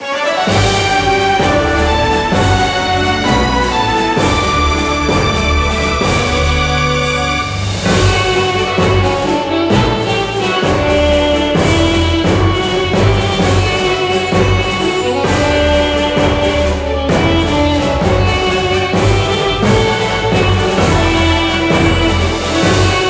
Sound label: Battle Win